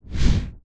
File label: Transition Complex; Premiere Composer